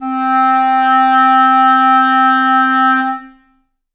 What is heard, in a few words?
reed acoustic, clarinet